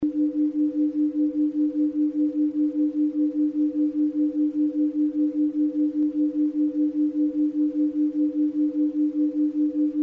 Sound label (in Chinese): 深度冥想